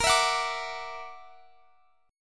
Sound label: Gb Augmented 7th Bb